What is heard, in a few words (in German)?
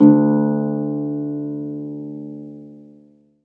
HARFE D1; Best Service